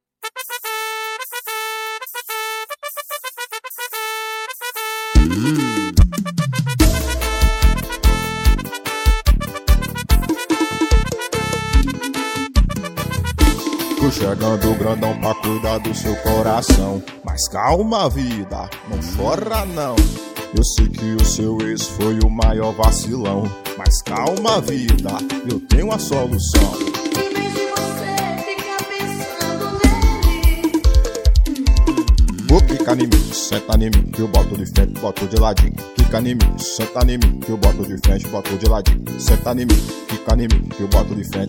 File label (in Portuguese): Versão Forro